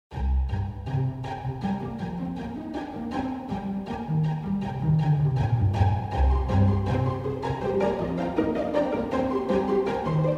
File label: Thought in Pizzicato